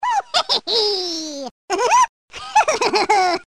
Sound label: laugh; bowser